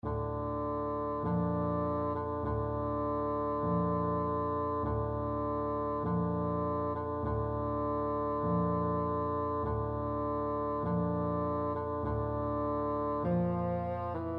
chopin funeral march, bassoon

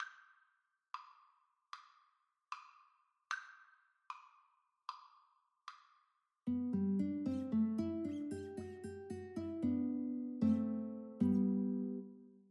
duet, Guitar

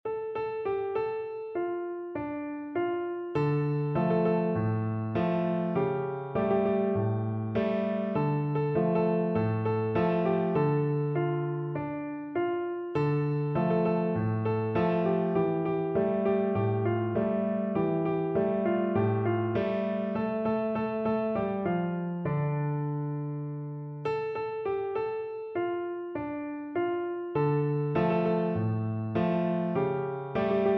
PNO

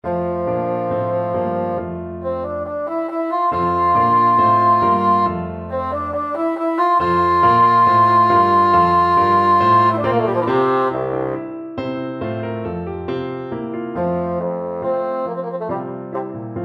galliard sonata, bassoon

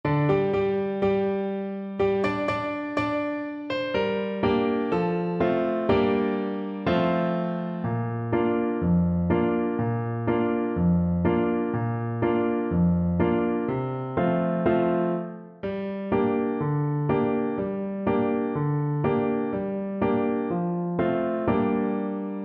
Clarinet

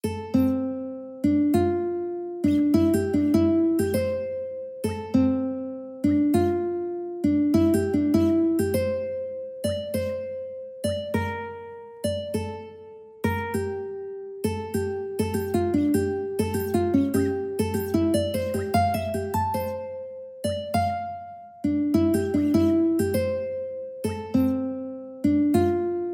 ukulele

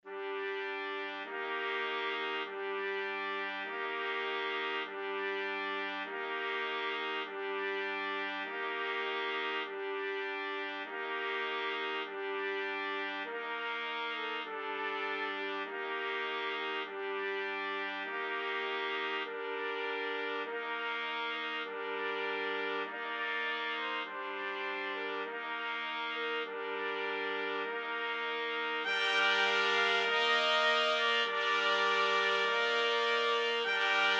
funeral march 4TPT, trumpet quartet

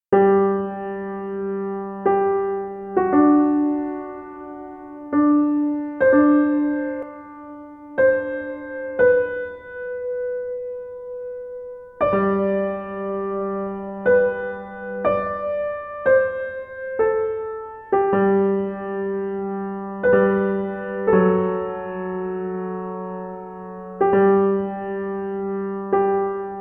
Kyrie Eleison, piano

